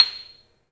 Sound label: MARIMBA, C; 3D Sound; 3D Keys VOL-1